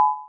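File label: 1000 Hz plus 850 Hz - 150 ms 150 beats per second, This tone combination has a sensory consonance.; (99% of Critical Bandwidth)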